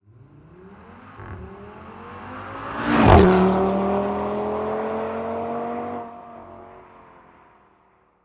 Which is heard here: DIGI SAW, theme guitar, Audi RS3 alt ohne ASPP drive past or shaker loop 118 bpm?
Audi RS3 alt ohne ASPP drive past